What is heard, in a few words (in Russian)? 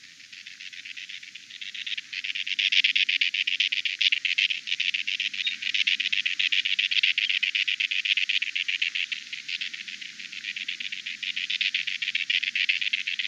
galstuchnik golos